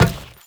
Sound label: Step Metal, Footsteps; Warframe; Teshin